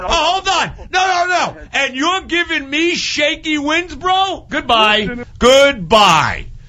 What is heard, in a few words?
Scream; WFAN